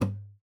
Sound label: STOP, GUITAR FX; Xsample VOL-2